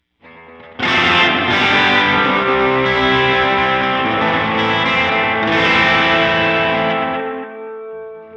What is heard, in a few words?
electric BASS; GEM Echoson; Pulsar Echorec